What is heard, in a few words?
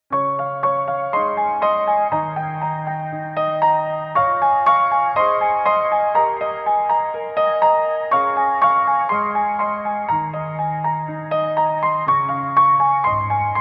Nord Grand Shimmer Upright